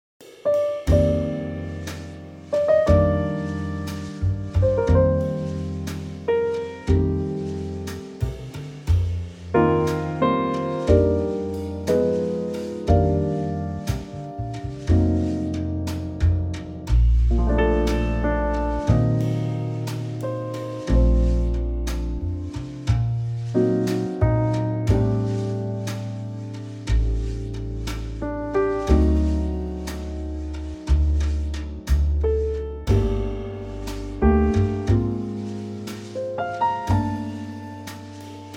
Bb trio; key - Bb; Sublime ballad, main theme only, in a really gorgeous Trio arrangement, with a cushion of warm chords

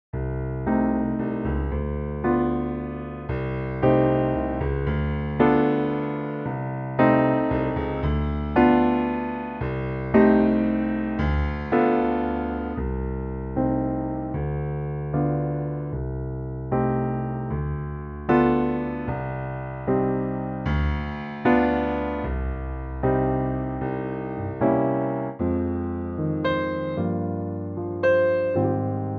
Cm piano; key - Cm - vocal range - G to G

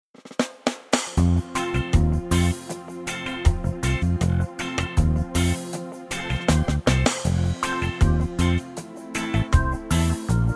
reggae; key - F - vocal range - C to D

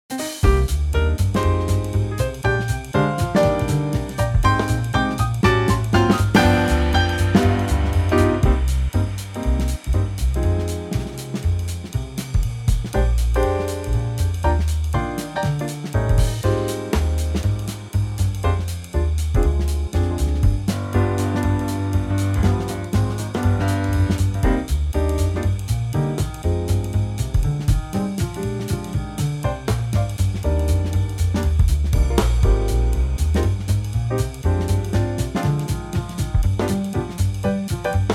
swing Cm

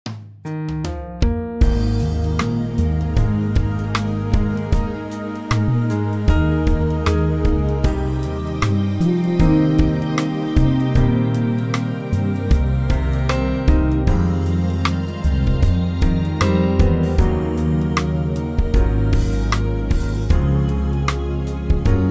Ab smooth

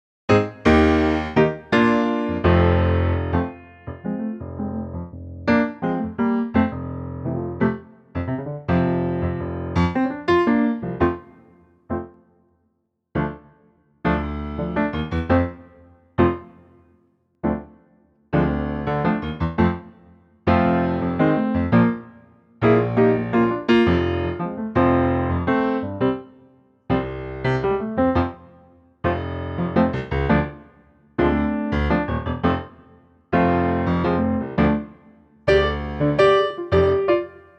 F Piano

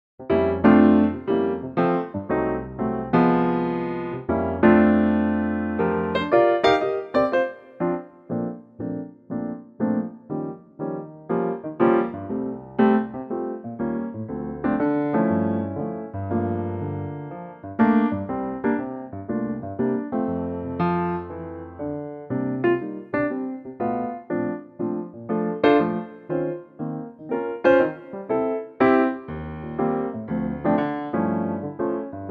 F piano; key - F - vocal range - G to A; A lightly swinging piano arrangement of this classic standard

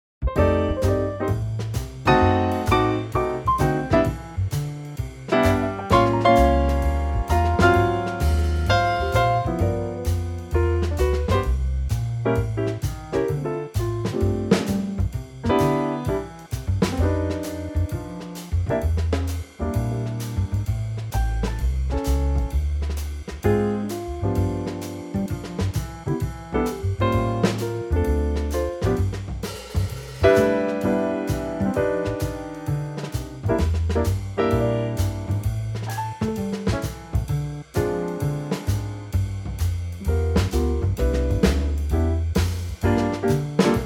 C trio; key - C - vocal range - B to Eb; Superb Trio arrangement of this wonderful standard, with some subtle chord changes thrown in

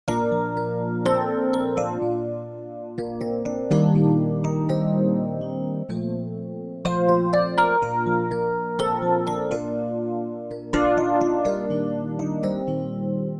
Electric Piano Bb to C

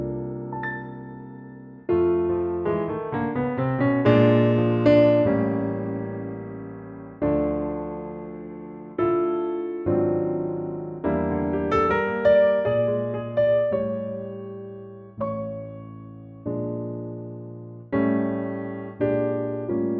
piano; Pianotrak verse demo; key - F to Gb - vocal range - A to Db (big note!)

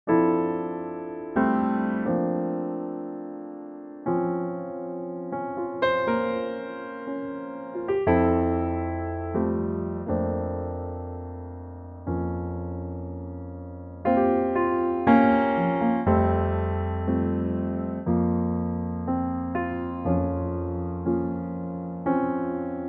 F piano; key - F - vocal range - C to A (huge range); Gorgeous piano only arrangement

